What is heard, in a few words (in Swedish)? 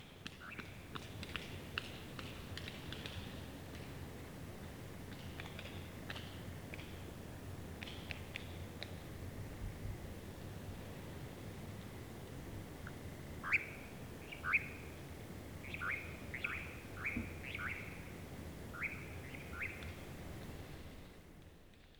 nattskarra; fågelljud